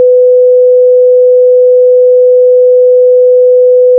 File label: sine 500Hz 1dB + sine 3.5kHz 67dB dither; ns 16bit